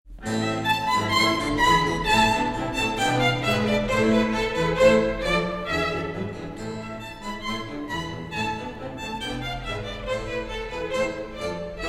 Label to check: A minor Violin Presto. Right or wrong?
Right